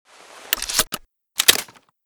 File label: protecta load, weapons; Mask's Reanimated Anomaly Arsenal